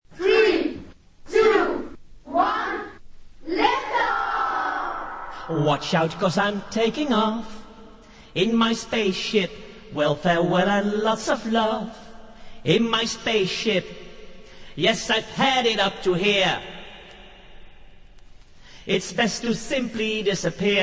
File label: acappella version